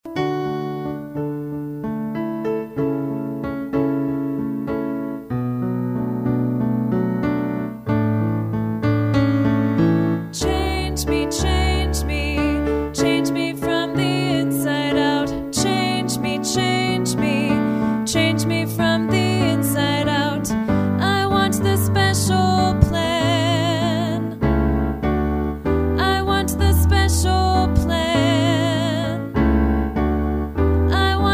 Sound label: vocal; PSE Music